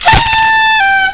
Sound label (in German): TROETE; psion cd vol1